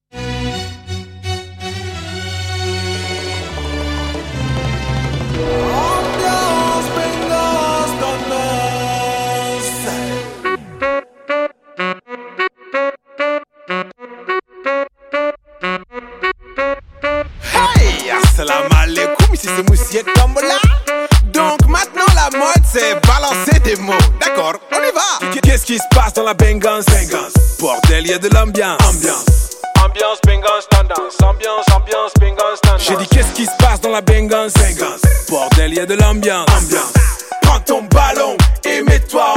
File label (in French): Ambiance Bengance Tendance; PLC Muziks 974